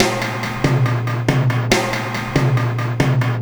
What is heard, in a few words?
Ripple Perc